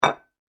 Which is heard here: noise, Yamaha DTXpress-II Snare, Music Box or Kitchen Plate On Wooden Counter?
Kitchen Plate On Wooden Counter